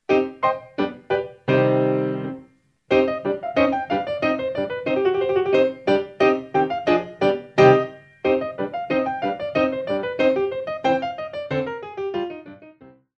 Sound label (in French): cloches class